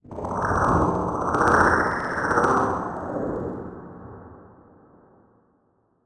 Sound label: Production Element Title Transition Liquid